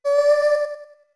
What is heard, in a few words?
Voice D2 med; OOT